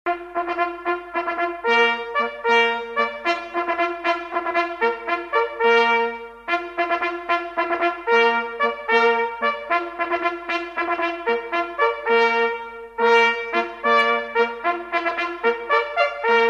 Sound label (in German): zum essen, Jagdhornblasen; Signale; Brauchtum